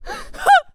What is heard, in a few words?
Vocal Joy And Excitement